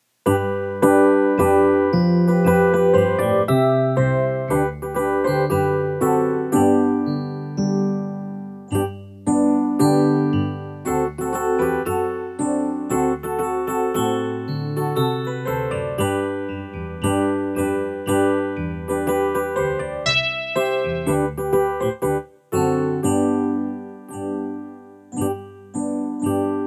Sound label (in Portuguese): playback hino